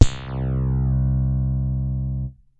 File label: Slap, Synths BASS